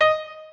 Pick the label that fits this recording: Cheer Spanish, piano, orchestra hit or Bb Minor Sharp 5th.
piano